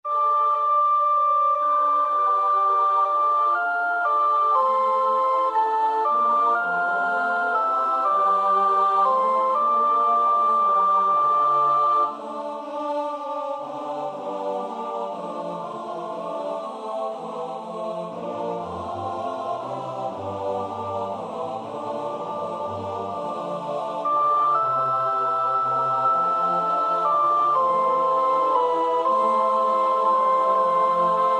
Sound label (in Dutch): sopraan 1; Röntgen (Julius); 6 oude Nederlandsche liederen